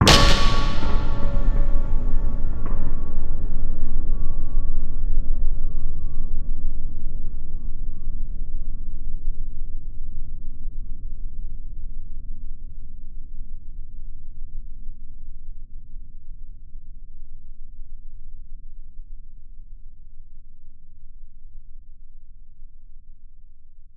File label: Crack Smasher, Cinematic Metal Impacts